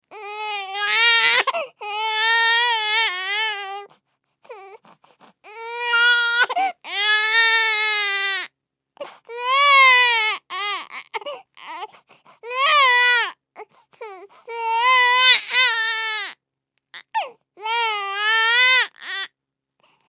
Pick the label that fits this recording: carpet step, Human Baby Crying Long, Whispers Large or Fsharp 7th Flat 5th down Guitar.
Human Baby Crying Long